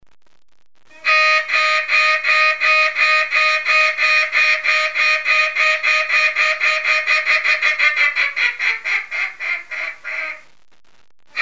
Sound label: Mallard Duck Competition